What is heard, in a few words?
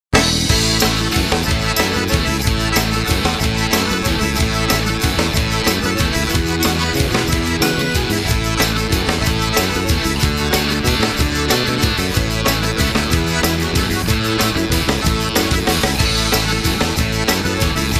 Hot Waltz